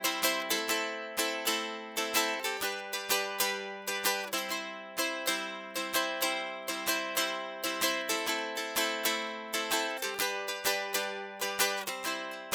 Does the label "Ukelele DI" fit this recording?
Yes